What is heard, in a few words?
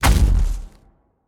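movement footstep; smite; god cthulhu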